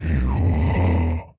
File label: grunt